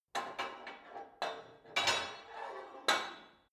put weight onto barbell unsteady; sport freizeit; fitnessstudio

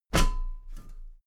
step metal ladder step top heavy; schritte

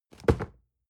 cardboard box medium fall low; objekte

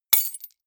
key ring large drop stone hard

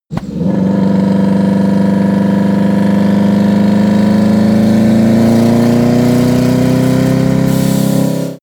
car vw t1 accelerate slowly gear

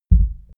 heart beat single frantic; comedy-cartoon; koerpergeraeusche

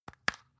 spice jar open

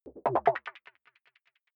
button echo wobble bright fast; signale

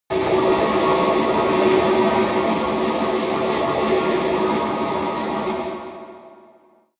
space alien energy plant high voltage; comedy-cartoon; elektronisch

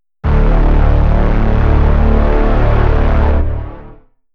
synth trailer hit, hits impacts; whooshes rises

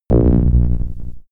low rumble; digital

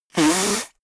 nose blow; comedy cartoon; koerpergeraeusche

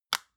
lotion large lid close medium; berufe; friseur

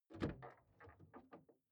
car door open exterior hummer h3

